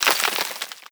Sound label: debris dirt, bullet impact debris